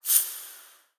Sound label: UI Production Cycle; Taps to Riches; android